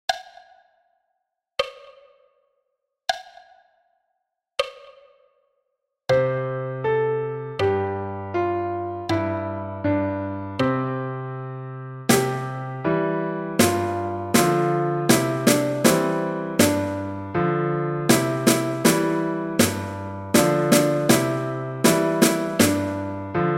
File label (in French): Piano et caisse claire